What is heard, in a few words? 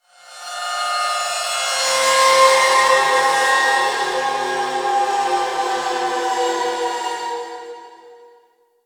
Distant Metal Textures, Asteroid; Ambience; Warframe; Grineer Asteroid